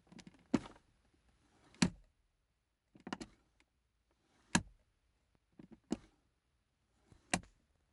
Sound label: Box Opening And Closing